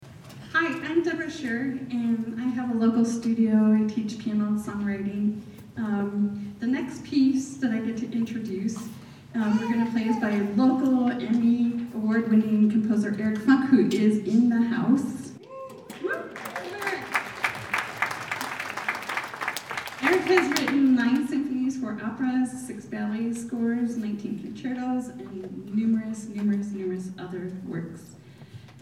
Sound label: funk intro; Second String Orchestra; Track 3 (Prelude, Funk) Introduction